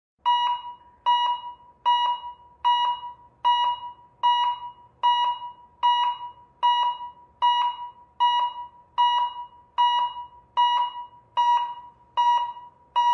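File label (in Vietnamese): tiếng nhịp tim ngừng đập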